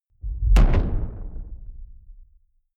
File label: sci-fi fade-in shot; schusswaffen